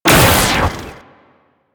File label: Metronome; Warframe; Amalgam Moa; Abilities